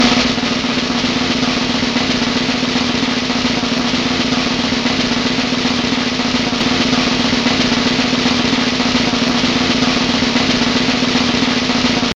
Trommel Geroffel; Sound Effects Deel 1

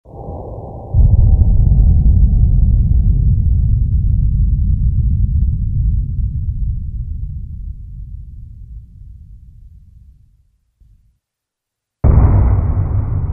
onweer; Sound Effects Deel 2

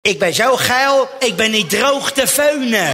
droog feunen; Sound Effects Deel 2

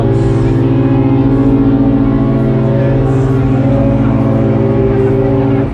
Duits Start Horn; Kermis Horns

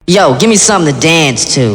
Hoefnagels jingle, Kermis Jingles; Kermis Jingles Diversen

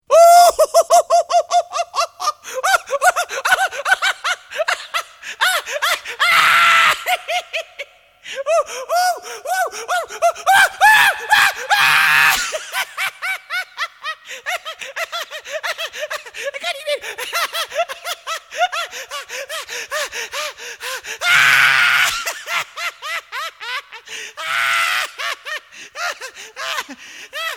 Lachen; Sound Effects Deel 2

